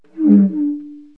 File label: big pipe; Wired for Sound Pro CD; Aristosoft, Inc.